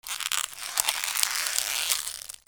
SQUEAKS

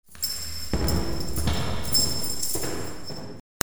FOOTFALL

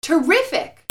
PEOPLE

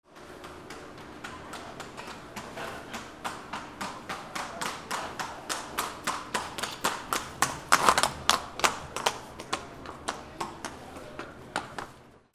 ANIMALS

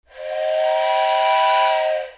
WHISTLES

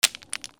FALLING

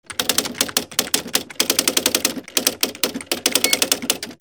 OFFICE

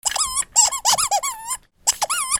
TOYS

